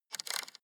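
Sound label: sports car interior volume button spin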